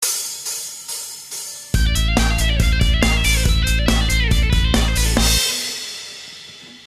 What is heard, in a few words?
taps